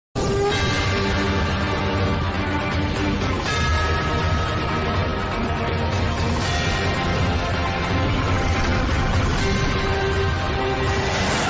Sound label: flute ringtone; adipurush